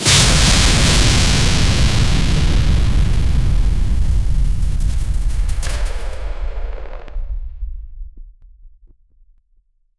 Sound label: comeback sound